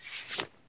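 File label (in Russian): короткий резкий звук перелистывания страницы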